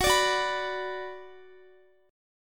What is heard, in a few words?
Gb Suspended 4th Sharp 5th Gb, down, Guitar; strummed